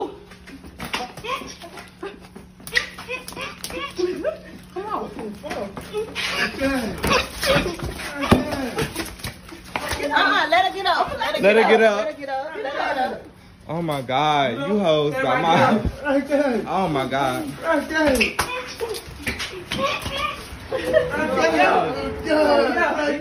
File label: FEMALE FIGHTING GONE WRONG